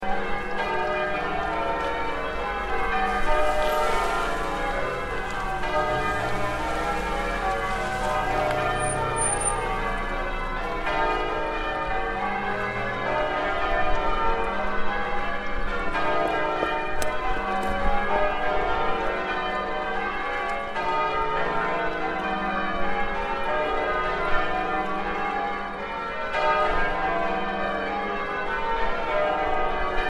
Merton College bells heard from Merton Street with accompanying street noises; on new year s